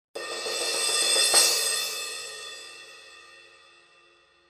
Sabian 14 AAXplosion Crash

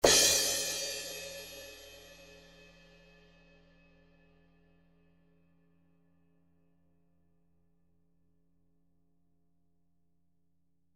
Zildjian

